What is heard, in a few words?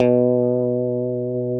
B 2 FUNK SFT, GUITARS, FUNK STRAT; Spectrasonics; Hans Zimmer Guitars VOL-1